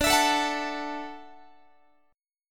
D-Major Add 9th, D, Guitar, 4A, 4E, 5A, 5D, 5G sharp, 6C sharp; strummed; tuning=4A,4E,5A,5D,5G#,6C#